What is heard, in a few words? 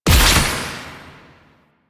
Damage Zone Place; Warframe; Nineteeen Ninety Nine; PvPvE